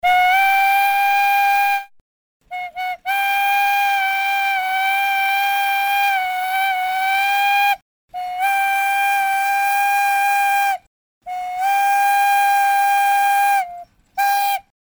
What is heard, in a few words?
steam engine whistling